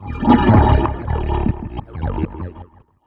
Bass Synth & Delay, contact mic, Tin Of Guitar Strings; musicradar; contact mic madness